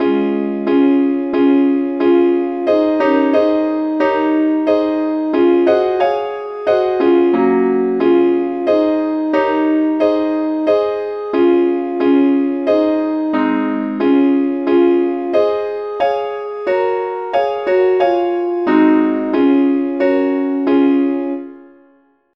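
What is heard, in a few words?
lead sheet, key D flat; SAINTTHOMAS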